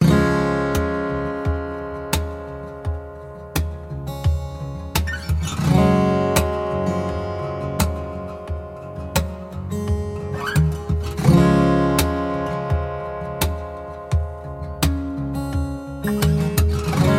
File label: Profound Acoustic Loop; MIUI14 and HyperOS 1.